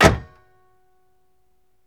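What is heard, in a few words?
TRUNK SLAM, Car; Transportation; VSI Car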